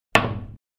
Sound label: Pool ball drop on pool table